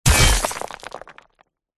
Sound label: impact metal object drop heavy smash into ground debris stones